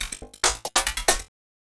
LOOP, 140 BPM, Drum & Bass

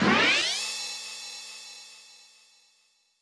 U220 FX Set, Drum Machines; Roland U220

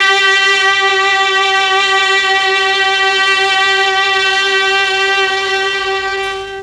MEL STR, Legendary M400; ILIO; Propeller Island

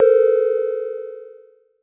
Sci fi

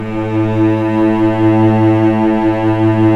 STR VC3, Vcs3 mf vb; String Master Series

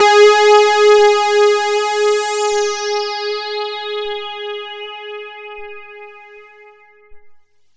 JUNO60, synths; juno106

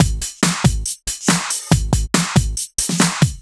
Break Beats, 140 BPM

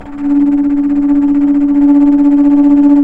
bass flute, flutter; Roland L-CDX-03 Disk 1; Bs Flutter

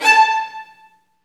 STR VNB2, Vlns Marc; Roland L-CD702; Vlns Marc1-3

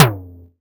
Synth Tom Lo, Percussion Hits

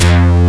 NOIZ LEED F2; ROCK

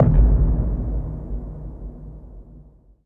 movie, impacts & hits; Techno Trance Essentials

